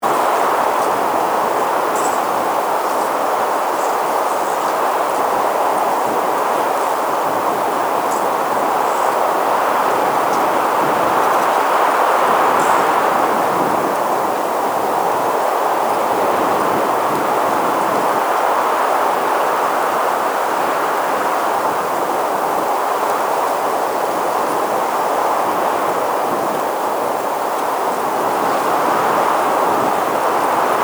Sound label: paranal exterior night wind, mono; soundscape